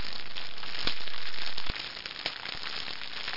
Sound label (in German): feuer